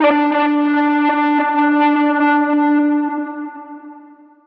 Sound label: synth ring D